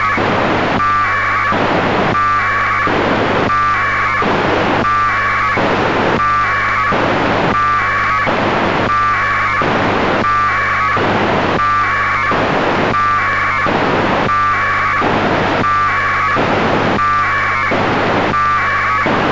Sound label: afsk